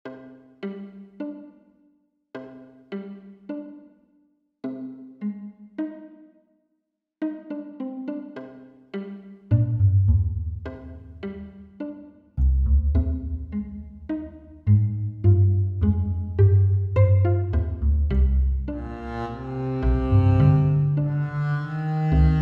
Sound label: orchestral